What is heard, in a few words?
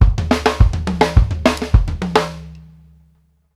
CLICKOFFS, Drumworks; Sampleheads - New York City Drumworks VOL-1